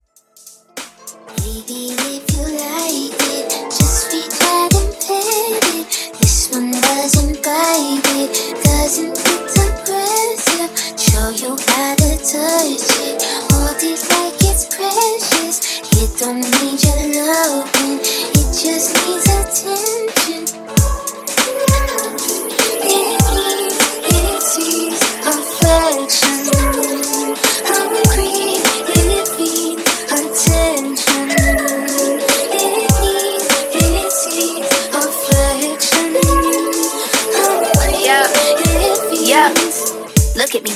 Top40 Re Drum 99 BPM

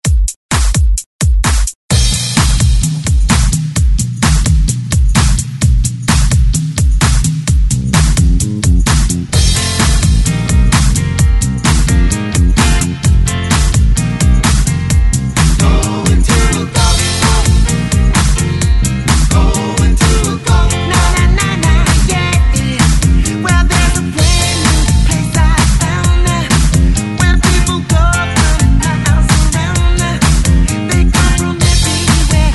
Re Drum 128 Bpm; DJDOLLS

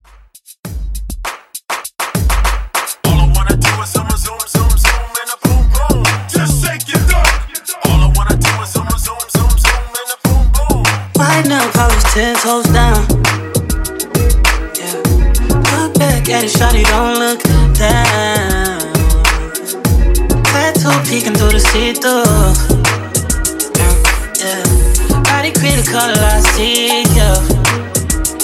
ReDrum Intro 100 BPM

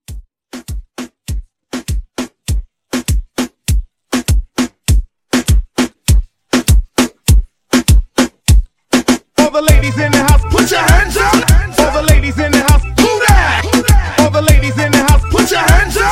Reggaeton Hype 100

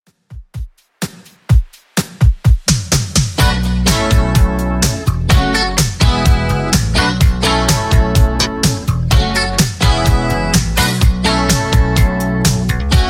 80s Redrum 126bpm

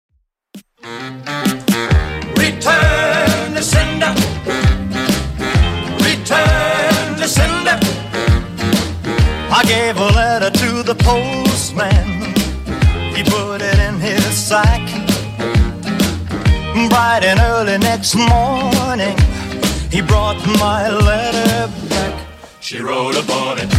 60s Redrum 132bpm

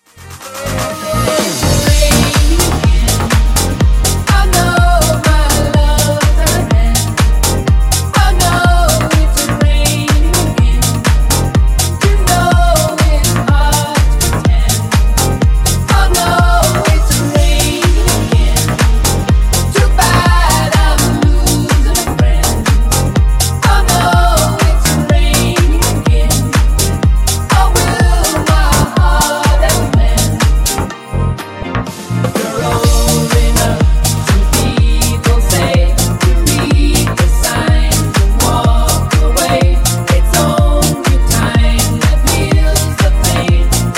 Disko Funk Remix 124 bpm

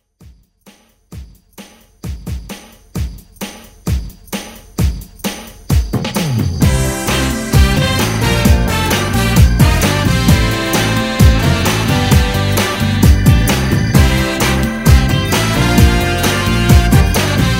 90s Pop; 90s Pop Redrum v2

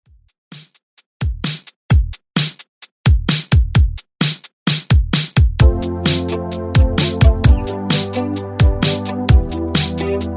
80s Redrum, 130 Bpm

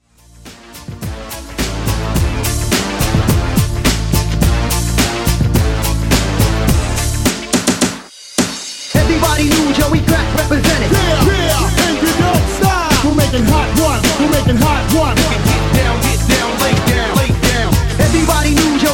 Party Redrum 106Bpm

